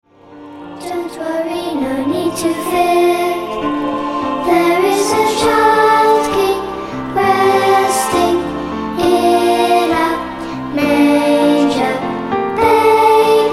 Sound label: Choir Of Angels